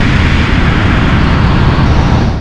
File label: bass